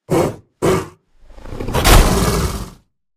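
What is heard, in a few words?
Dinopithecus sound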